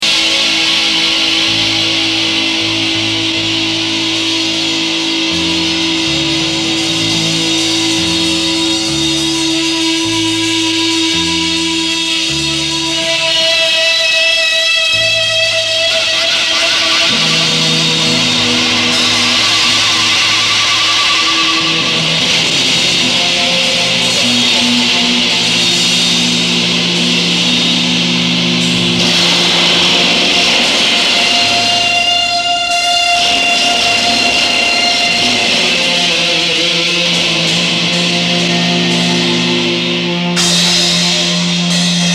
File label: The coolest Feedback Ever